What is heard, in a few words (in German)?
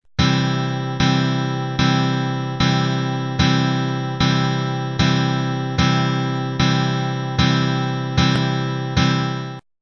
c dur akkord